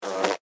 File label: Hornet Land Short, Animal, Hornet, Land, Short, Fly, Wings, Buzz, Insect, Bug